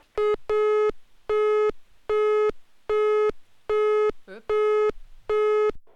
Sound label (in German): Telefon Piep